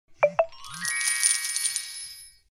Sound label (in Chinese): 收钱到账的音效